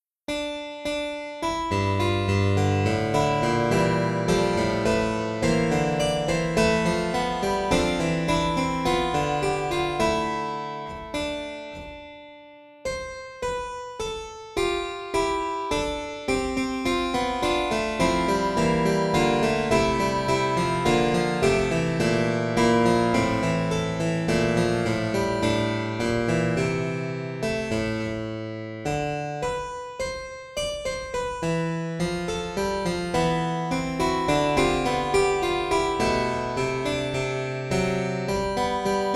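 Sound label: Coro, Pianoteq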